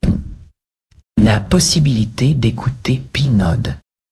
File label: jingle